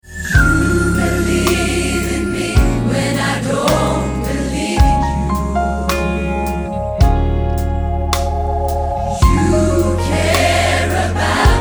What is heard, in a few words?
Joybells Short